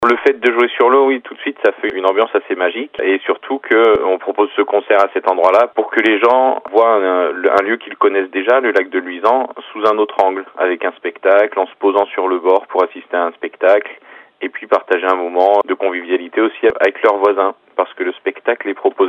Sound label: piano